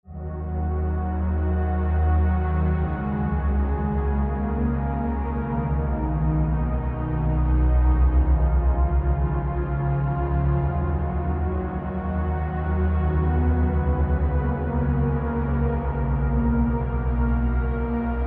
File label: Pad; Routed Sound; Spire Expansion - Flame Summoner Vol.1